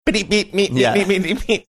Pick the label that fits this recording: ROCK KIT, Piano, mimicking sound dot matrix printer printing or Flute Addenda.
mimicking sound dot matrix printer printing